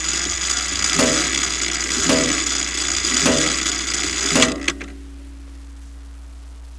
Kitchen clock

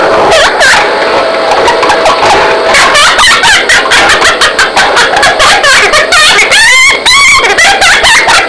scream

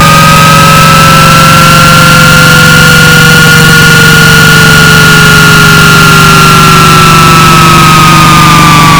Loud noise Loop; baldis basics

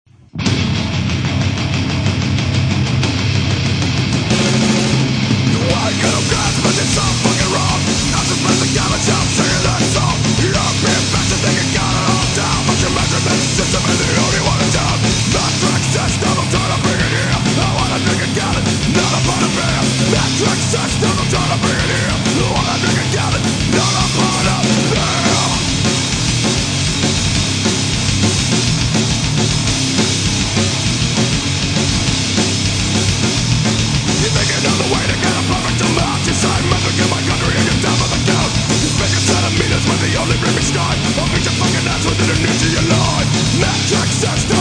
rough mix

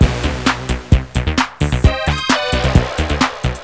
electro

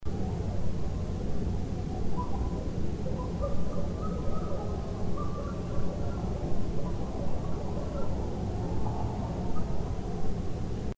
monkey call

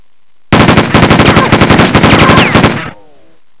machine gun

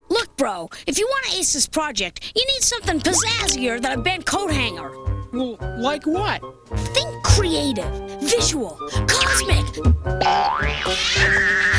close

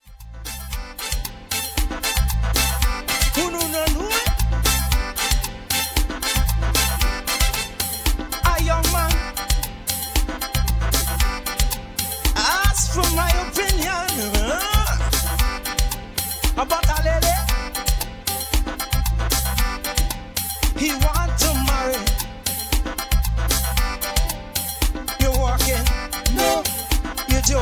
horn

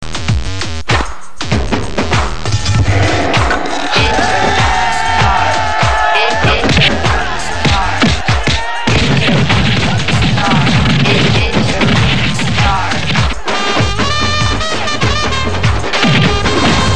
Bat Striking

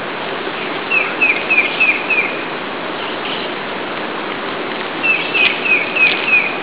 titmouse, tufted titmouse; birdsongs

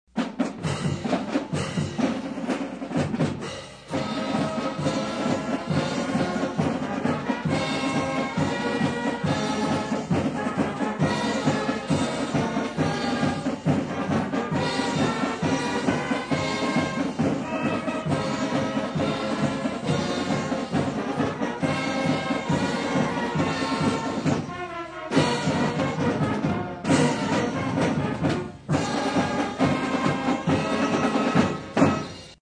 band

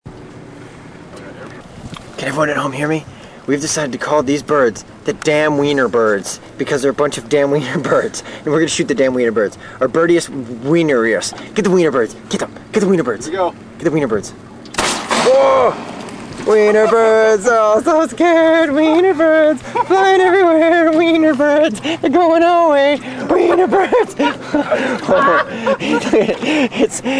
weiner birds; blast

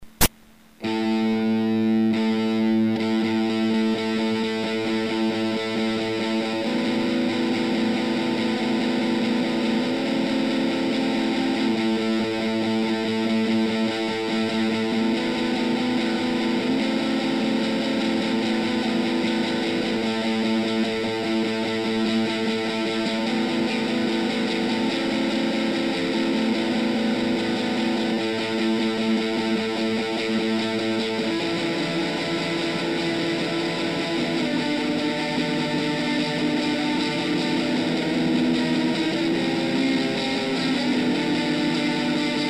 guitar

